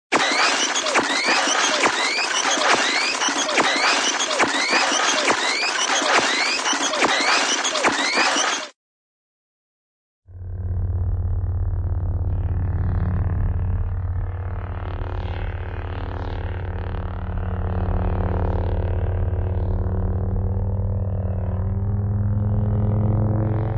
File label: motor, cartoon goldmaker